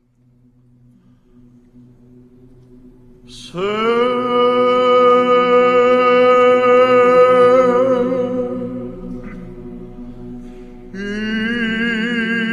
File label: Se Ymnoumen, Choir; DaskalakisChoir